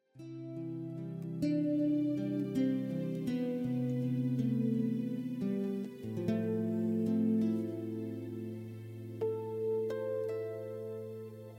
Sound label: Guitar Light